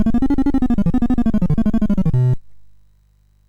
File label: MOS6581R2, GAMES; Sammy Lightfoot